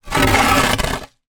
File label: foley wood plank small drag ground; zapsplat